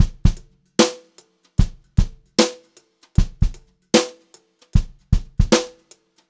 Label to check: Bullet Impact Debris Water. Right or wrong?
Wrong